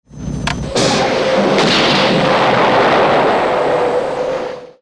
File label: Missile Fire Explos; Airwolf